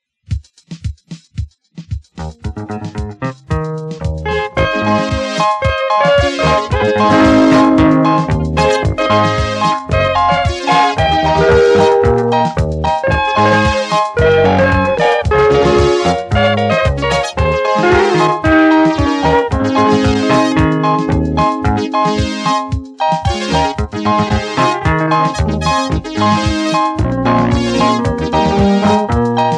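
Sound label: instrumental, african style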